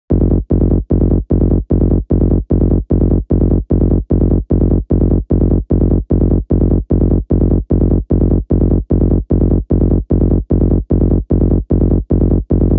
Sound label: Bass F#m 150BPM, Techno; Rave Melody; Underground; Stems; Ghosthack; 7 Free Rave Melody Kits 2025